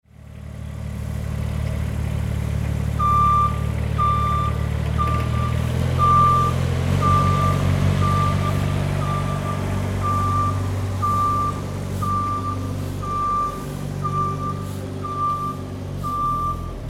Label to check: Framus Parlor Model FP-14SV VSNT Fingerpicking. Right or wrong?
Wrong